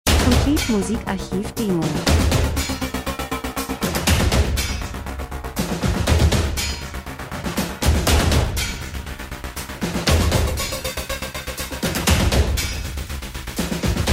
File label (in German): drums, rhythmus; Spannung Elektro Rhythmus Chaos, Aktion, Lifestyle Zeitgeschehen Maschine Industrie Dokumentation